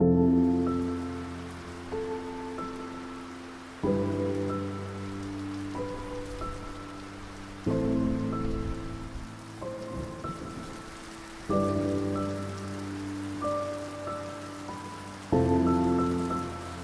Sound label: Piano Rain Sounds; بی کلام